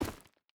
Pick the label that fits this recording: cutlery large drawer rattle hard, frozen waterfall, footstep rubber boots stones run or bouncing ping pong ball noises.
footstep rubber boots stones run